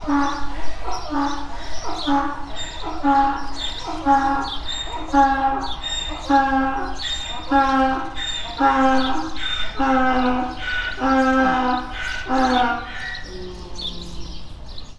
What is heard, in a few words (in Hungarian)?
közben verebek csipognak, magyar parlagi szamár; debreceni zoo 2012